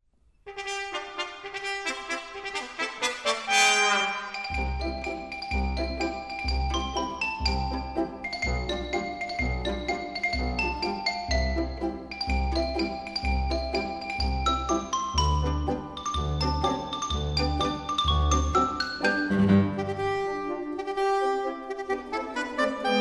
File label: jazz suite polka